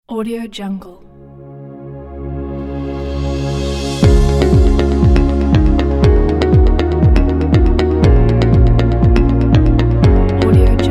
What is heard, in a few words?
corporate energetic